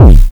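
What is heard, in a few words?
bass drum, trash kit; Drums Dance & Groove; USB Soundscan vol.11; AKAI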